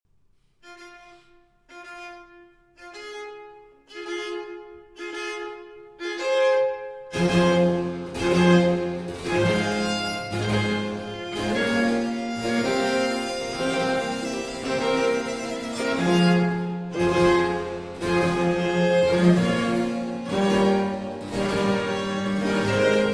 Concerto For Flute, 2 Violins and Basso Continuo in G Major, Adagio